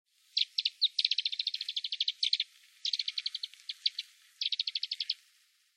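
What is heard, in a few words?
iwahibari fly, Alpine Accentor; fuji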